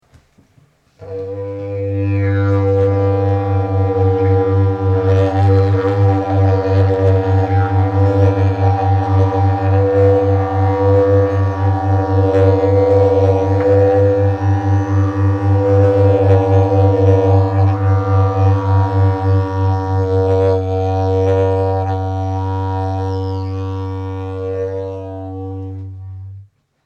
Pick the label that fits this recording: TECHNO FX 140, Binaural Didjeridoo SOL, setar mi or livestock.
Binaural Didjeridoo SOL